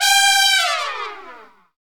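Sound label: trumpet fall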